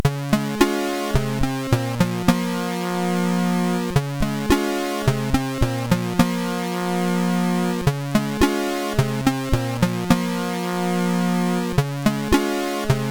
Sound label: sid CSG8580R5